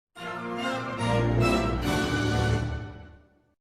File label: fanfare sound; sptv